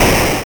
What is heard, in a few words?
Window Break; Parish Window Break; Gloom